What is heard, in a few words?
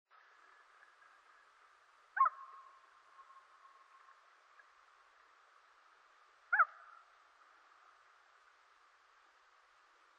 hoots